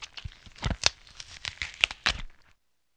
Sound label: GLIBBER